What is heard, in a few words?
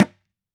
dhi, mrid